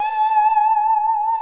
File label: saw danger, music, zombat